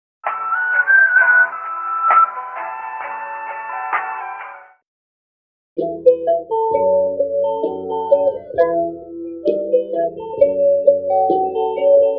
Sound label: guitar whistling, Loops